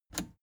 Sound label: plastic button scratch smooth push enable